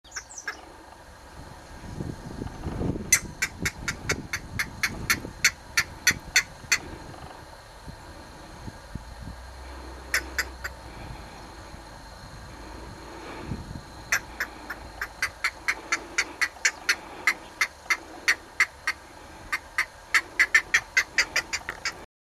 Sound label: green heron